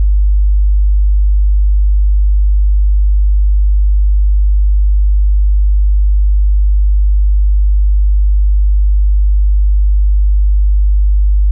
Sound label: 50hz -6db